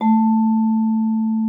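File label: vibraphone A3; Xsample VOL-4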